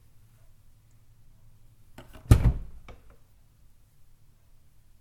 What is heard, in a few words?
open washing machine door